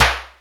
Loud Rap Snare Drum Sound C Key, snare drum sound tuned to the C note